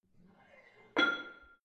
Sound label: barbell plate slide in hit ring soft, Fitnessstudio; sport freizeit